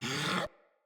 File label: Puppy Idle Jump; Enemies; Vocals; Kubrow